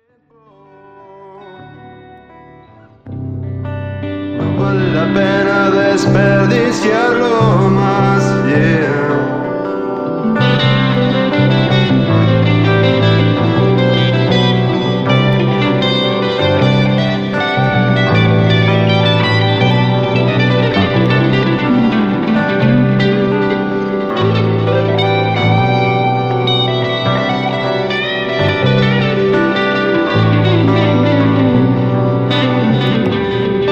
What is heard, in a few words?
Rock ballad